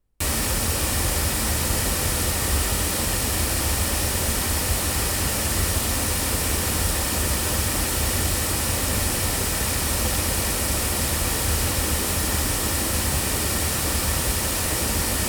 sE Icis loudspeakers, microphones; se electronics

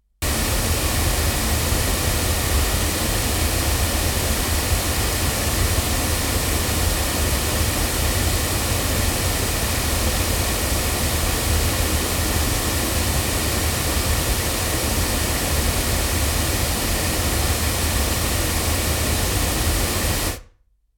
Hamburg loudspeakers; ADK

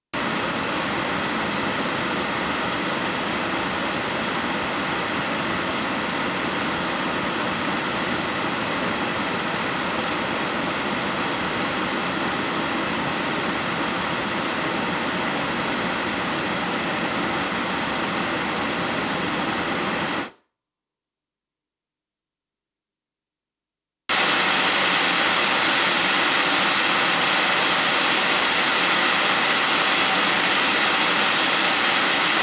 Heil Sound PR 20 UT loudspeakers

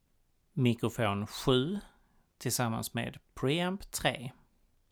mic pre

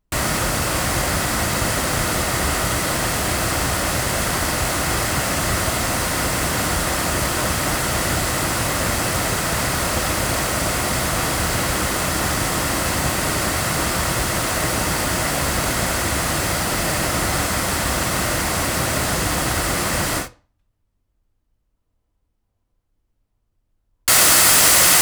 loudspeakers; Pearl CB22; microphones

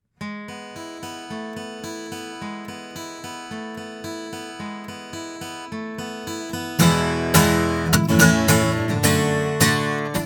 Neumann TLM170 cardioid guitar

